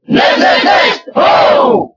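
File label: Cheer French, crowd; Ness; SSBB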